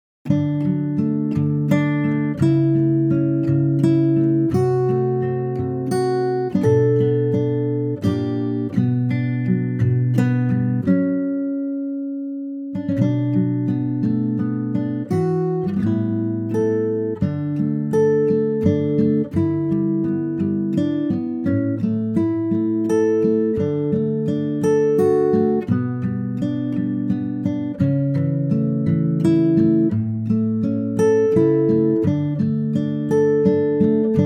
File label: D acoustic guitar; key - D - vocal range - G to A; Wonderful acoustic guitar arrangement of this old favourite